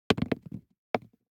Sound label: DICE on Plastic, Throw and Roll, Foam, 2 Two Dice; Samples; ArticulatedSounds; DICE Sfx Party (Extended)